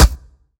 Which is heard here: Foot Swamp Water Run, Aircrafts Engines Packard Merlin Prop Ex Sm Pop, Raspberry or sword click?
Aircrafts Engines Packard Merlin Prop Ex Sm Pop